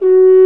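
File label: F#4 FRENCH HORN; ORCH.COMP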